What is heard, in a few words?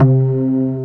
SYN PERC, Wavestation; Sound & Vision - Gigapack I CD 2